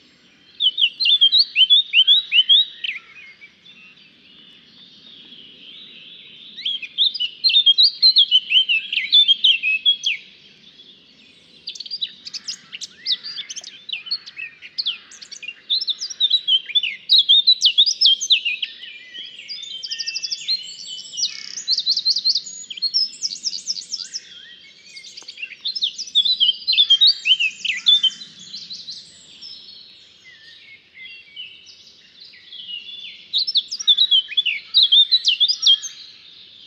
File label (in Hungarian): baratposzata hangja